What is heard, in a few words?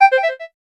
clarinet sharp; SKY IM-A710K-VEGA-Xpress; keytone